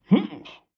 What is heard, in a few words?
humpf